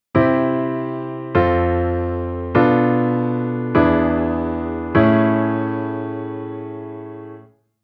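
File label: Chord Modulation Secondary V7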